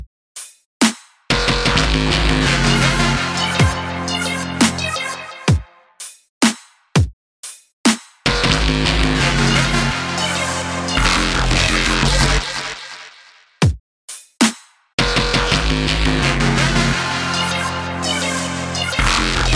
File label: Drum Beat